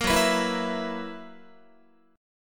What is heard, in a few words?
F sharp 11th F sharp Guitar; F#11; strummed